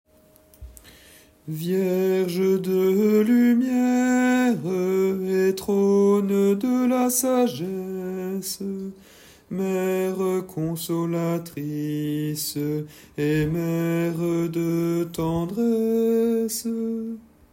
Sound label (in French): Chant du Jubile Couplet 1 Basse; sanctuaire